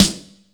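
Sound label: snare drum, Casio CTK-5000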